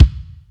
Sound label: short bass